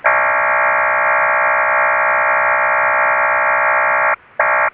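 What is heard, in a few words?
CLOVER 2000 BPSM, XCLOVER in BPSM mode; PSK-systems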